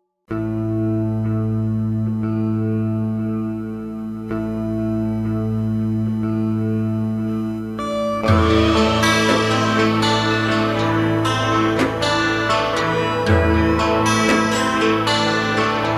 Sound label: western